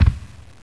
tyrant foot

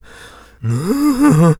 sigh, zombie

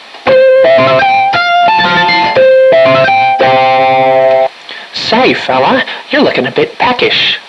vend; ambience

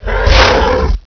penta attack

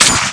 Colt, weapons

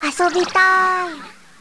long jump

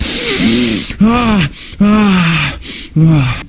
sniff

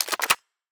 mp5 stock

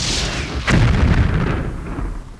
surface explosion

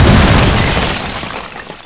frost nova

